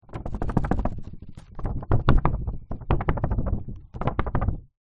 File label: card birthday shake woble